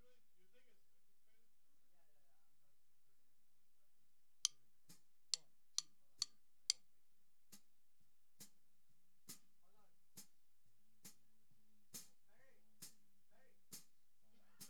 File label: Snare; Pro Tools